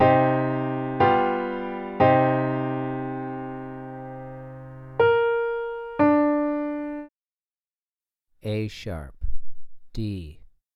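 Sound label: 2 Note Melody Minor a#3 d3, piano; ear training; melodic ear training for musicians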